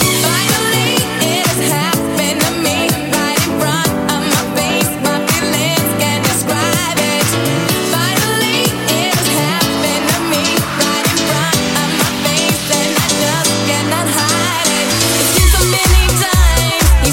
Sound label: Snippet 125bpm Bm